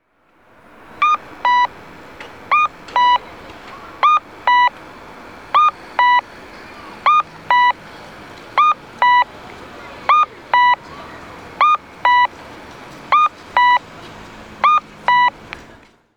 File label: kakko, onkyo kosaten; rikuun M82NG; saitama tokorozawa boeiidaibyoinmae